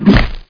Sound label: bod strk, bugs; Starship Troopers